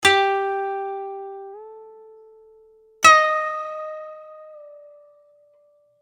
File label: koto